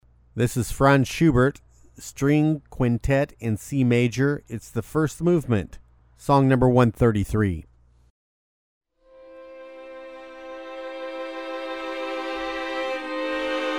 String Quintet in C Major; Franz Schubert; first movement

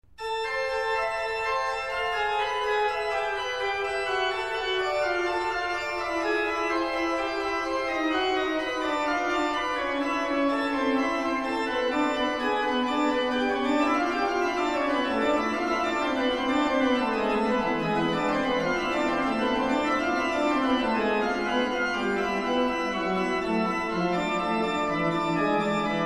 Prelude and Fugue in A minor; J. S. Bach

